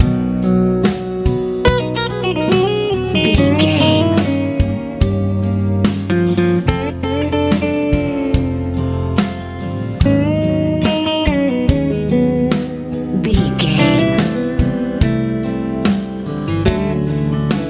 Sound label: hot sunny golden retirement love country ballad